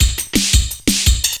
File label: loop, 170 BPM, drum & bass